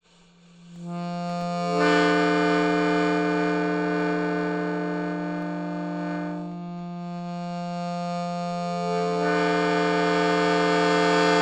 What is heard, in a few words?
Clusters, Melodica